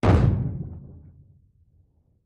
30 Feet Primacord

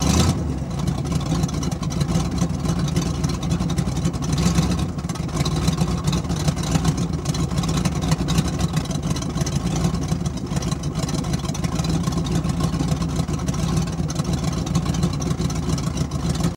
Car Idl Sputter Bad Ex, exhaust

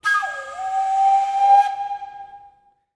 Columbian Flute

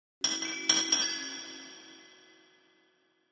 Chinese Chimes Bells

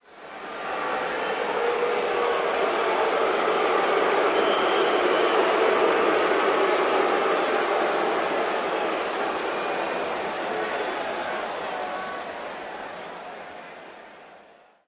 CROWD BOO, large

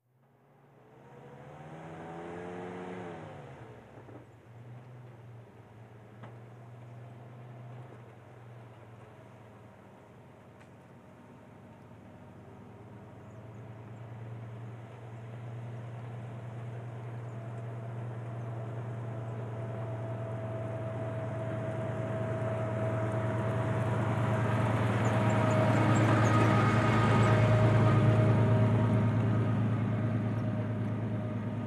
1947 Dodge Slow By, dodge pick up

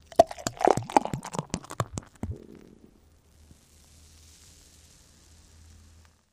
Bottle Pour Beer Glas; eating & drinking

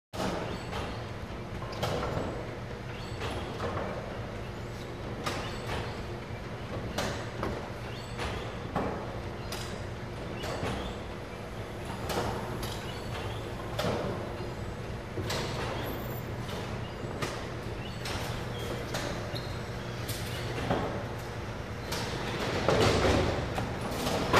Bowling Pin Setting

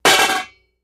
Hubcap Manhole Cov, crashes

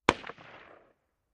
Ak47 Machine Gun Sing

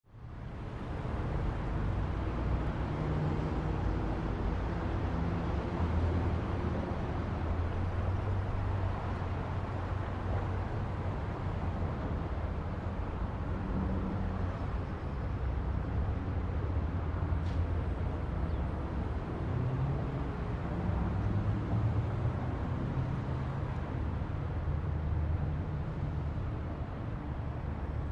Traffic Off Screen

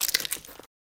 Book Cr, books; Crypt Keeper

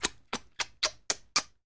Kisses: Sloppy And Funny, From Close Perspective.

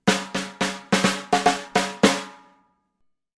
Drums Snare Accent

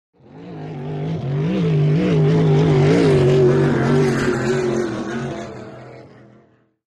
Power Boat By Fast, off shore power boat

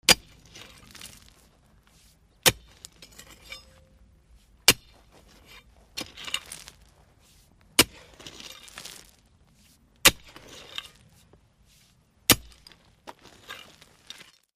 Pick Ax Impacts Metal

